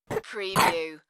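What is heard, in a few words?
Child Cough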